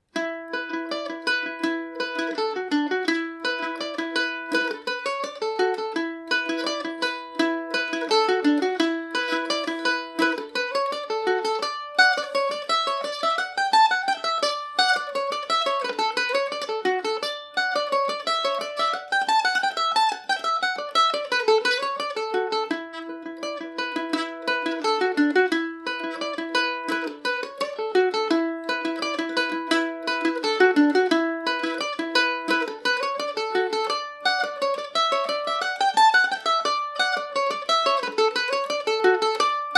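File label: E dorian, Irish mandolin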